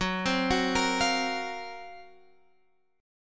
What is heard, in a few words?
F sharp Major 7th Flat 5th, Guitar, Open F; F#M7b5 Chord; arpeggiated; x,13,12,13,13,12